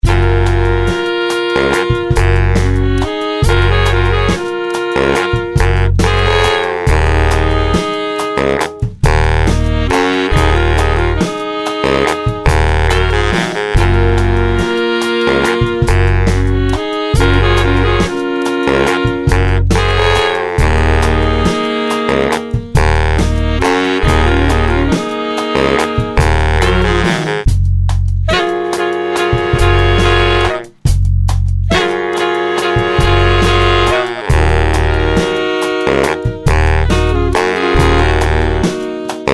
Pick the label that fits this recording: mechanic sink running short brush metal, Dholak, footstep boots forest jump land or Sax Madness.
Sax Madness